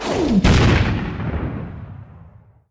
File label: missile launch; FalloutTower